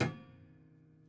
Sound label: Prepared Piano